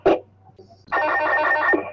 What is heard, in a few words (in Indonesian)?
Radio Kereta